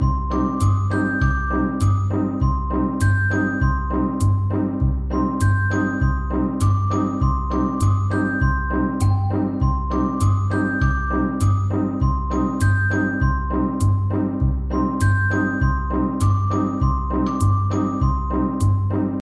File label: Song